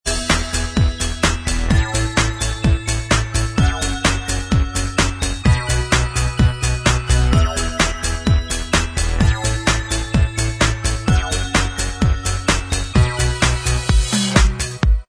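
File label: instrumental; shaun the sheep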